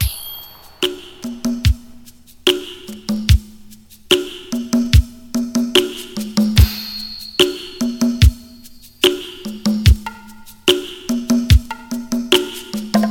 73 bpm drum loop g sharp key, Free drum loop - kick tuned to the G# note. Loudest frequency: 1557Hz